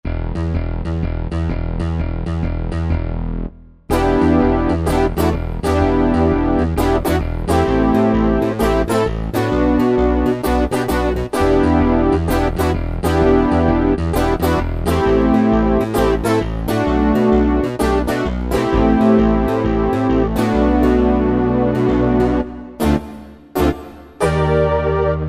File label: Fuzzy Pop Clav, Korg Wavestation A-D